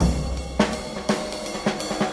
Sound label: blue notes; Drum loops